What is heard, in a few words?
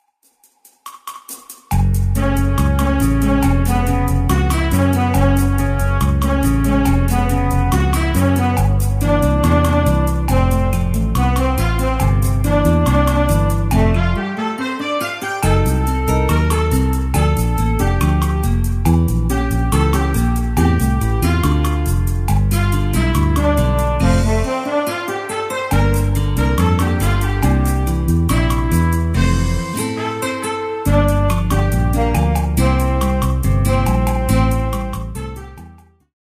BOSSA